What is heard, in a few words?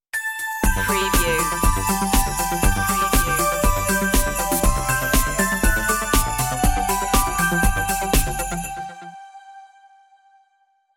merry christmas synthified stinger intro